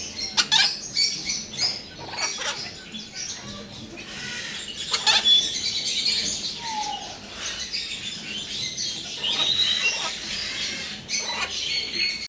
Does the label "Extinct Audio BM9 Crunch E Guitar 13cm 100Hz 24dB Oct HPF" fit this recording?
No